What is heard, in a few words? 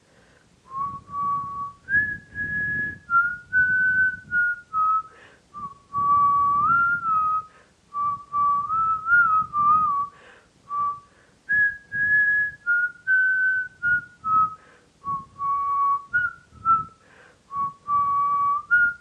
whistling mystery toon